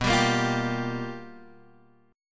G Minor 7th, B; Gm7/B strummed; JGuitar

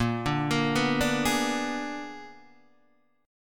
A sharp 7th Flat 9th, Guitar Open D chord; 8,5,8,5,5,6; down

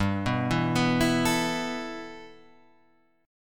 G 6th Add 9th G, chord; G6add9 arpeggiated

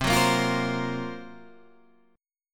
F sharp Major 7th Flat 5th C

